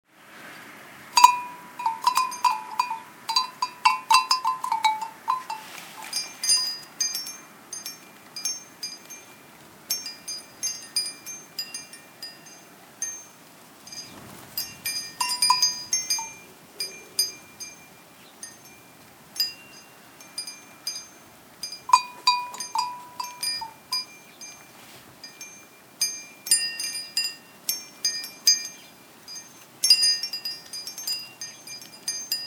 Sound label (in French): Clochettes jardin